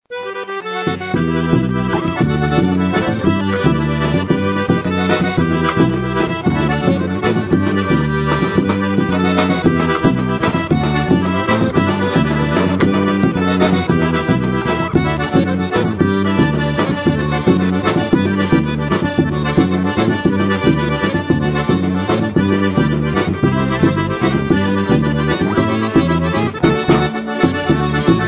forrobodo instrumental

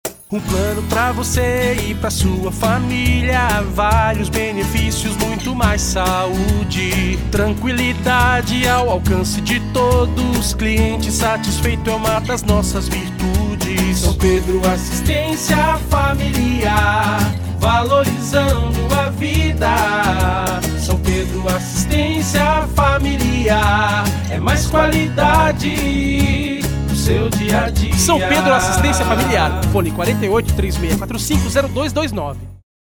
jingle; assistencia familiar

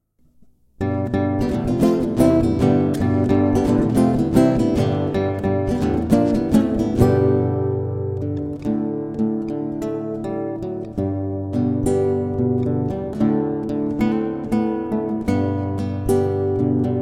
instrumental

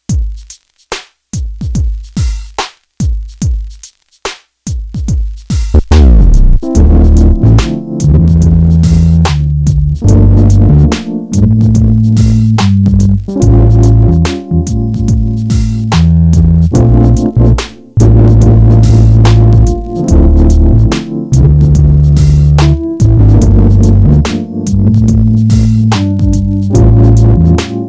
groove phone

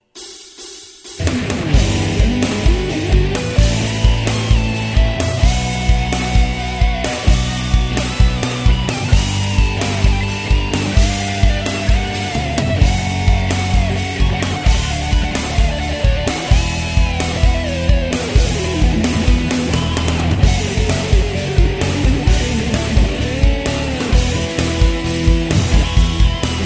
isolated solo

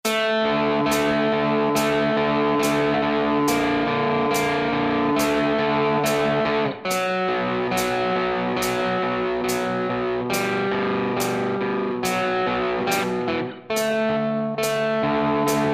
instrumental

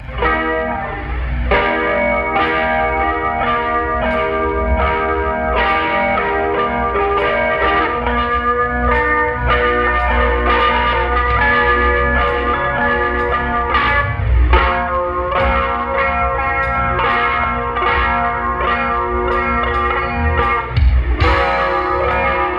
guitar

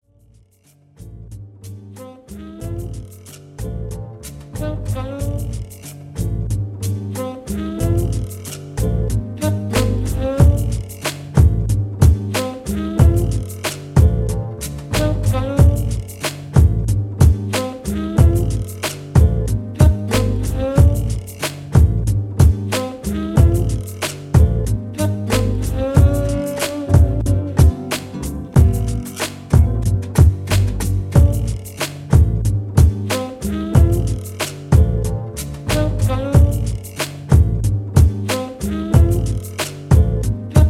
jazz

